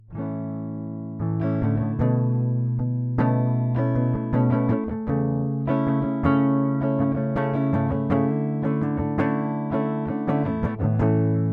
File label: Tape Project